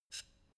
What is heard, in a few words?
pneumatic pump light short